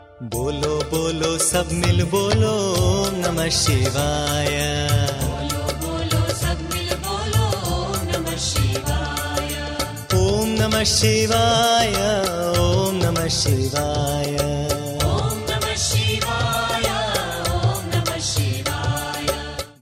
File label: Bolo Bolo Sab Milkar